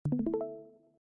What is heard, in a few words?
screenshare start; DiscordClone